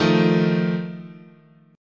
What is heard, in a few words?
D sharp Suspended 2nd Flat 5th, guitar chord